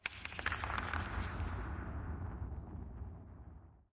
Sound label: crumble, Mines; AMB; OneShot Fading Memory